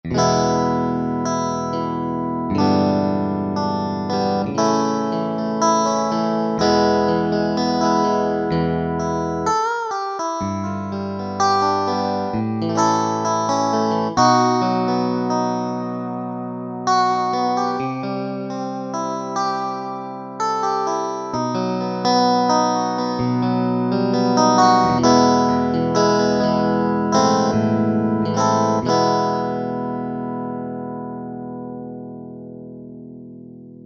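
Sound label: Vint Strat, guitar, Yamaha EX5R; Factory presets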